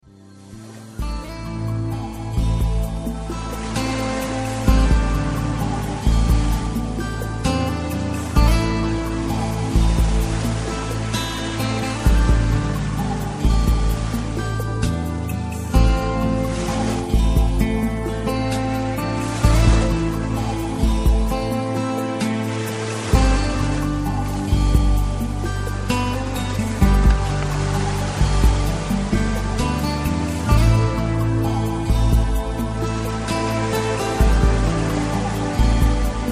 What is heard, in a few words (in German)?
Am Meer Entspannungsmusik mit Naturgeraeuschen